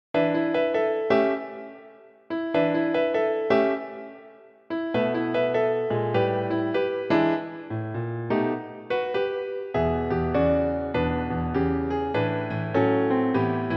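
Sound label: piano, Blues für Klavier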